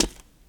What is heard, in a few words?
Foot Step